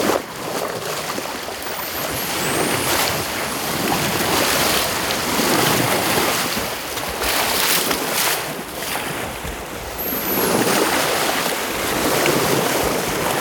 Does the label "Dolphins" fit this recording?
Yes